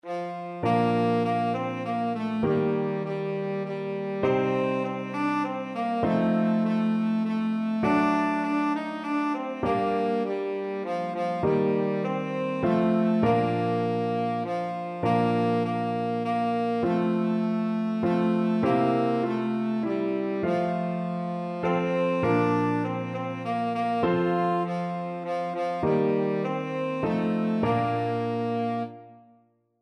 tenor saxophone

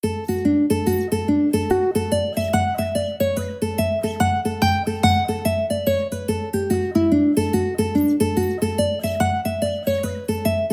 the harvest home, mandolin

